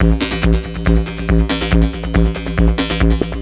Beats, Industrial

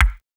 Kick, Fairlight CMI III

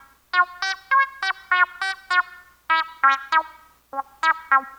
Bleep Synth; Sonic Foundry (Sony Creative Software); Stylus Pressure Urban Grooves on Digital Wax; Bleep Out

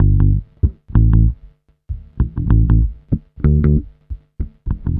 BASS, BASS GTR

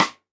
Xstick; Roland SPD-S

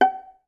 VAS PIZ F#4, Orchestra; Best Service; Peter Siedlaczek's Advanced Orchestra

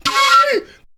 FLU + VOC, FLUTE + VOCAL

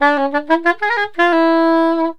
SAX

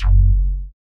WET BASS; Masterbits; Dance Trax

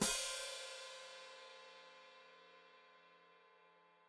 Hat Closed Open, Hi-Hat

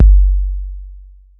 BD 808

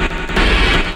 fx scifi; Ueberschall; Techno Trance Essentials

